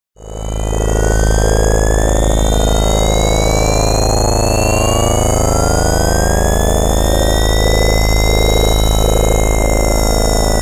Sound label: Drone Warped Warble Industrial Hum; 5.1 STEREO MIX; Blastwave